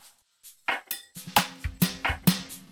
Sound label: Triplet (Drums intro)